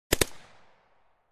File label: Colt M4 firing multiple bursts